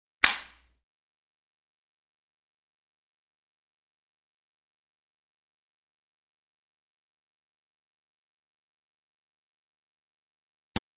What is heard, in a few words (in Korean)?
Fights, 싸움-전투; 헐리웃특수효과음